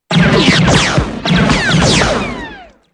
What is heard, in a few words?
blaster; esb